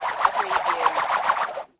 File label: Grapple Gun Rope